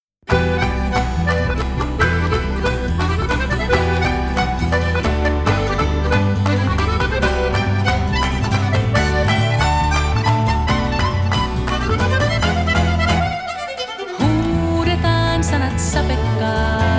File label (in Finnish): tango orkesteri